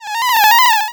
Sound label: Cry